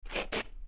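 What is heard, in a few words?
gritty cement shuffle, footsteps; chief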